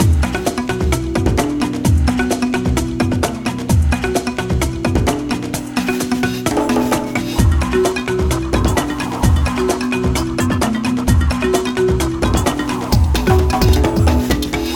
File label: jungle fiesta